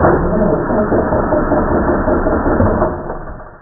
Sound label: car start fail